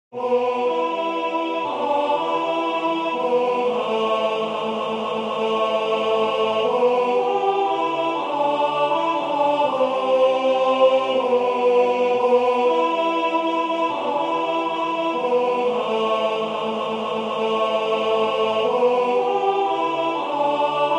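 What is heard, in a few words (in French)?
alto, Musique sacrée; Jesus nous croyons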